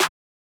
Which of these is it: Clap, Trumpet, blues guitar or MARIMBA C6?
Clap